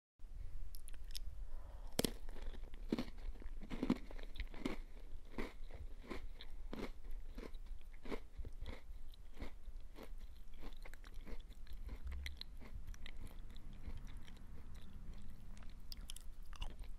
eating cookies

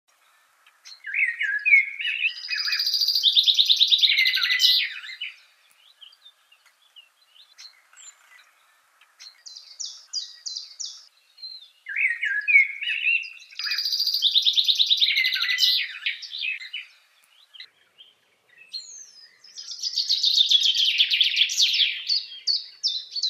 common chaffinch song

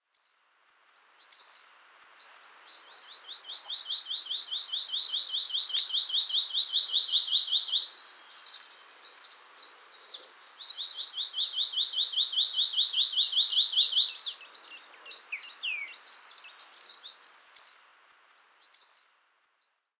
Scrub greenlet

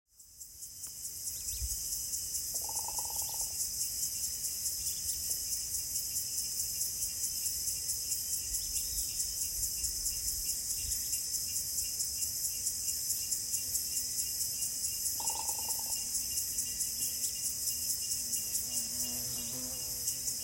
Green ibis; پرنده اکراس سبز

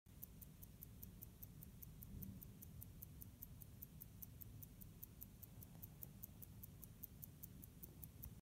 pocket watch

